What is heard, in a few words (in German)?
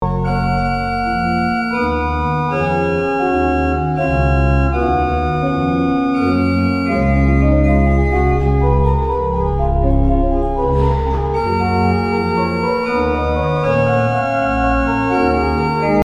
orgel; microphone test directional mics; ortf; AKG c480 ck61